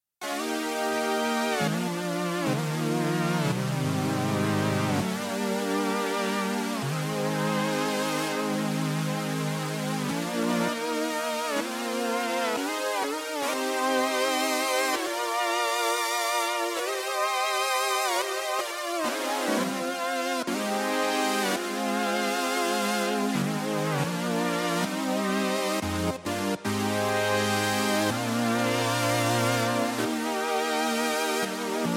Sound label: KEYS